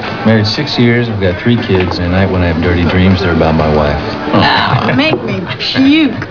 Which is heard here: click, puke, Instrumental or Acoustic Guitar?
puke